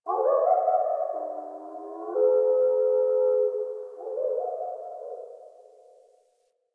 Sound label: Drifter Camp Bird; Ambience; One Offs; Birds; Warframe; Quests; The New War